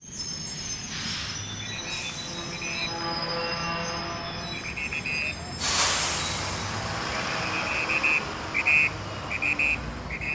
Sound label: ambience mexico city street traffic whistles police; felix blume